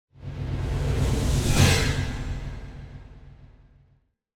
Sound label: Symphonic Hit Rising Surprise - Deepest; Hollywood Edge; sound designer's toolkit v2